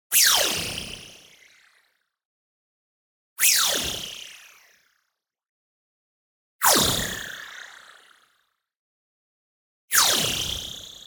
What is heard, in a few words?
stinger, noise maker down; boom library; Anime Essentials; DSGNMisc